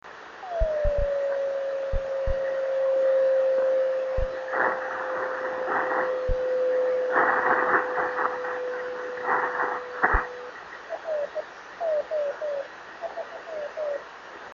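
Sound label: drift net beacons; RO3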